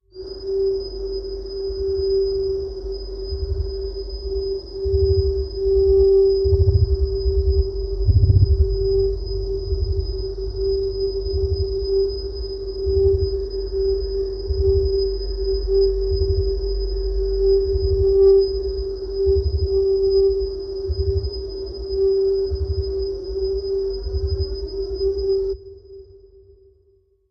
Machine, Whistle, Low Drone, Low Hum; Patience Trainer

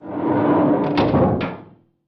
Hatches Spaceship

